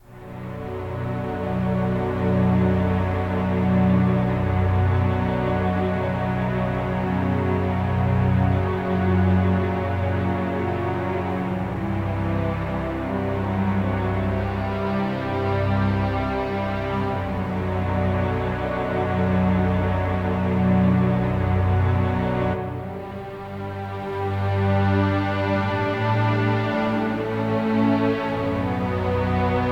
Ambient, Strings Stem; ps4 ps5 switch windows xbox one xbox series xs

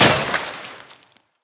Bullet Impact Wall; Prison Architect

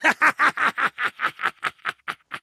Attack grunt; We Happy Few

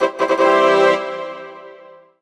Orchestral Brass Positive; MUSIC EFFECT; startide

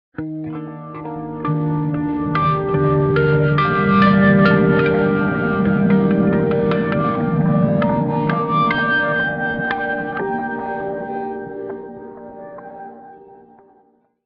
G steel

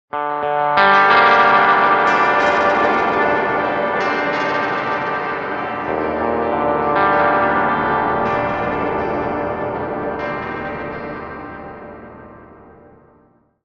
G steel

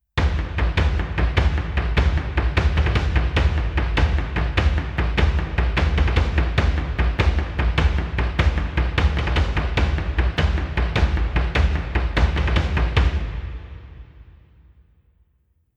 Hype Drums; everhood original soundtrack 2022

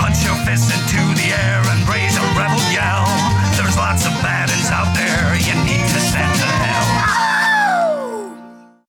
Sting; Zombie Perksacola - Packapunch Sting

